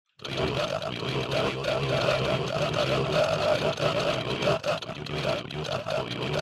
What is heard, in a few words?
toyota granulized